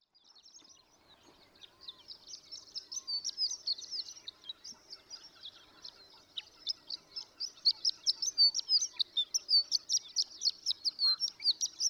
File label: Horned Lark, birdsong